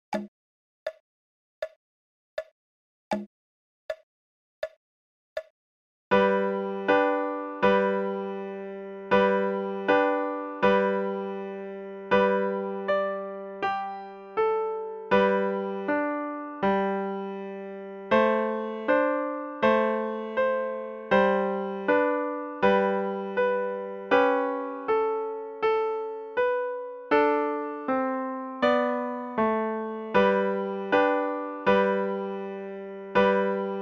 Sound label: Jingle Bells Slo